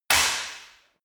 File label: sword hit sword single